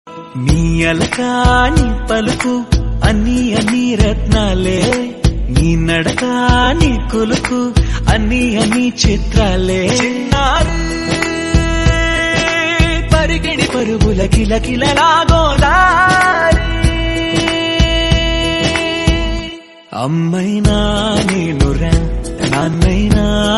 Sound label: father daughter song